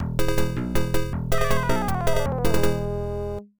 Lose Arena Battle; Hillsfar; PC-9801

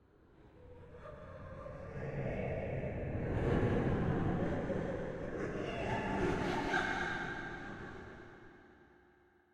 Eerie Distant; silent hill homecoming 2008 gamerip pc ps3 x360

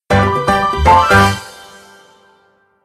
Levelup; Garfield's Diner; Android game music

